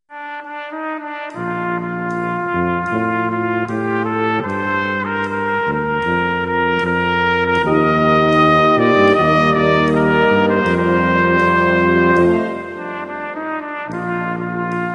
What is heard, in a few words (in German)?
Weinende Trompete